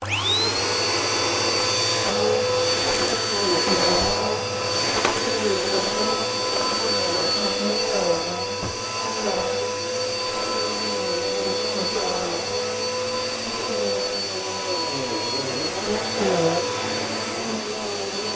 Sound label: household vacuum hoover whirlwind on operate off